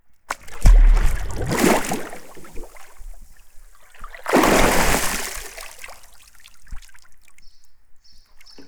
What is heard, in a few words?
Water Splash Pool